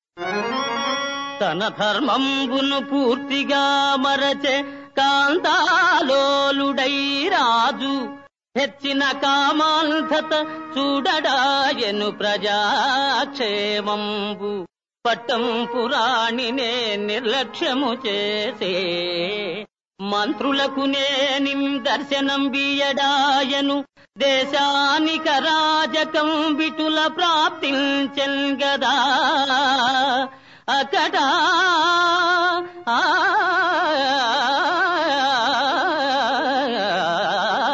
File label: Padyam; Appu Chesi Pappu Kudu